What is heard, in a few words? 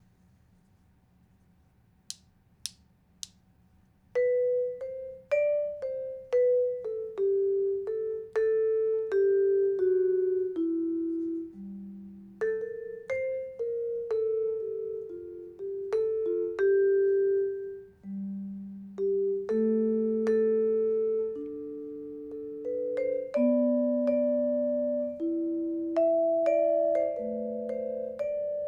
melody, vibes